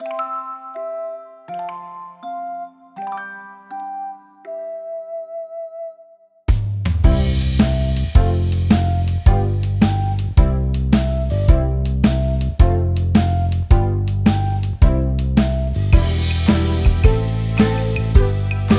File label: Instrumental